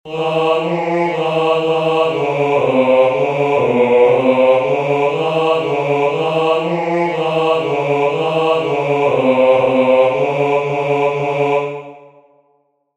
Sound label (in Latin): Martyrum chorus laudate